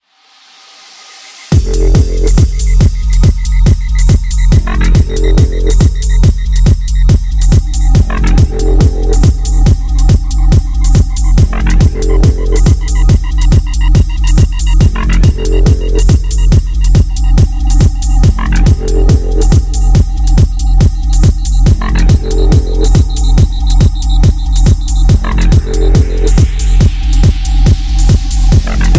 Dubstep

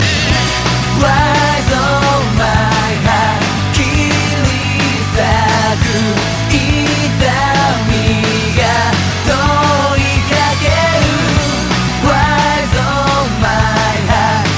Wild of Bass

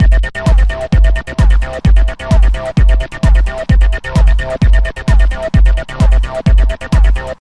Groove

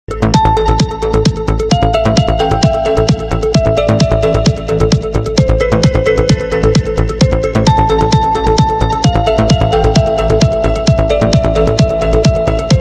easy trance

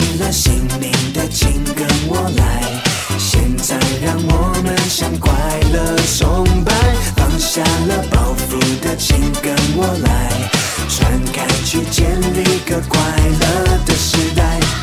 happy song; latino

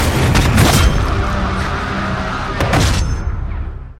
ghost call

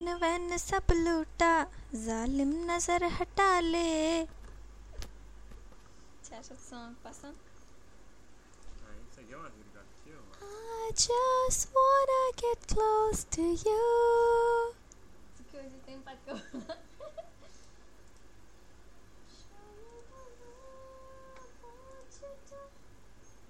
girls sing

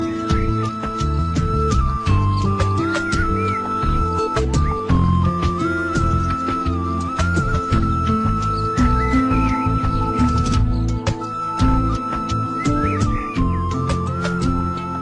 flute

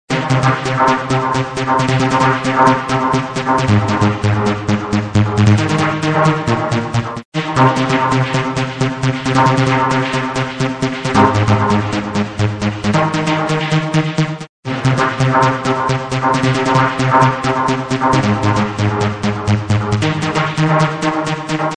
Zynth sounds

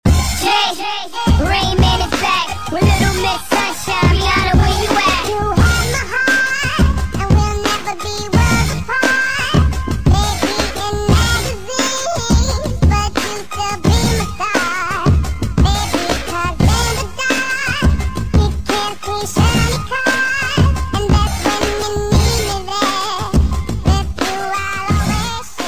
chipmunk

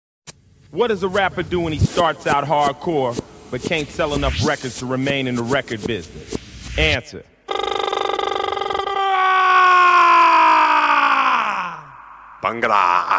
bhangra rap

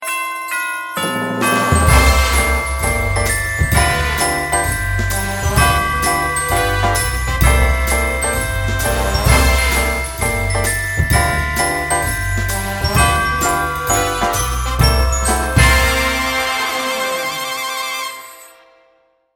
sleigh bells; ringtones

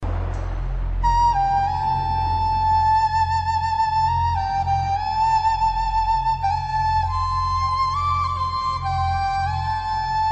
flute feel; classical

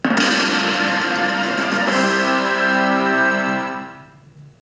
dungeon victory

